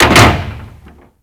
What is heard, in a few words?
Mover Metal Clunk; Ambience; Props; Warframe; Orokin Derelict